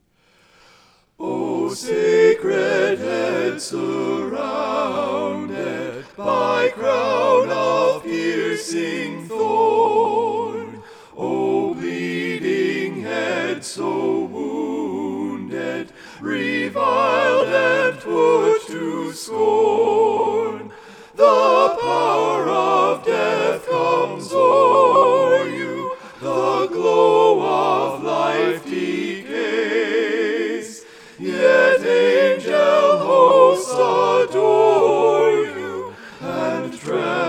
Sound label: O Sacred Head Surrounded SATB